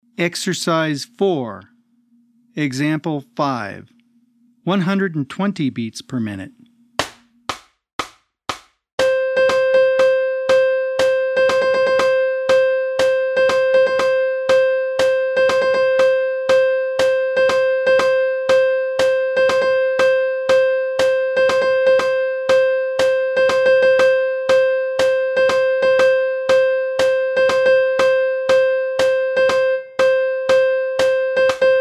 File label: 120 BPM